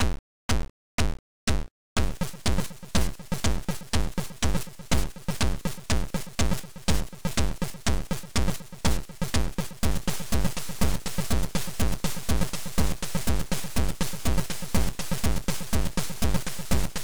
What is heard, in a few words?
DRUMS